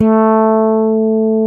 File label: ROCK FRET A4; Drums In Your FACE!